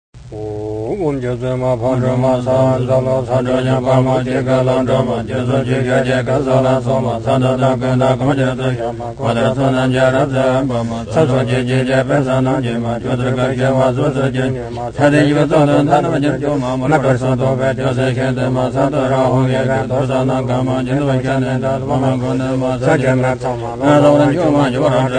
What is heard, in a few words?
tara chant monastic fast